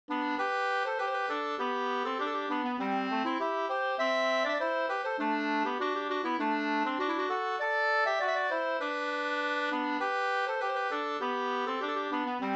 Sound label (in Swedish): ganglat; Pa vag till timmerhuggning av Goras Anders